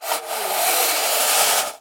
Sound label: Sand Scoop Dig Metal; sound effects bible